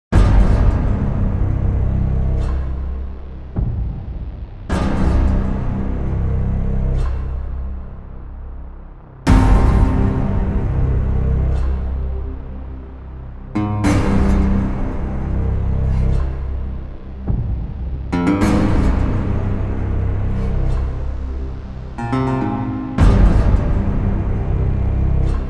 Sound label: Dark Disturbing